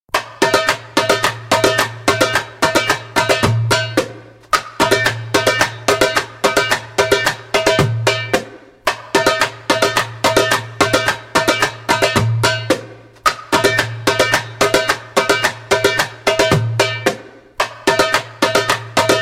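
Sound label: Tickling Ticks (fast) spoken, djembe; Djembolale - Fills and Drills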